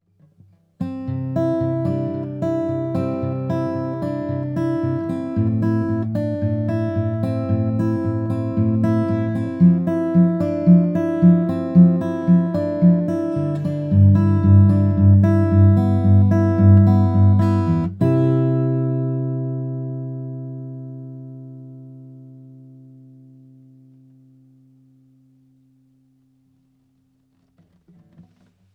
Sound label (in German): Sonic Farm Creamer SE Test Akustikgitarre 3 Ribbon